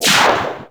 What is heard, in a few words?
Laser Long